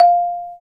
Bass Marimba

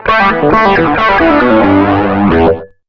SOLO L, Electric & Acoustic Guitar Loops

